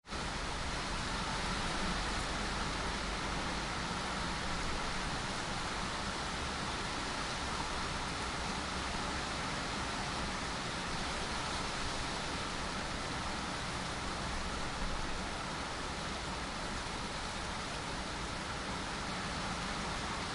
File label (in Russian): Vetryanyi den